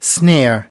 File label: snare